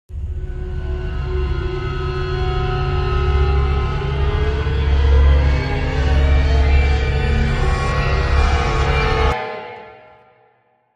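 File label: Crime Sting, No Percussion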